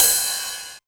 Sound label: 909 Ride; Drums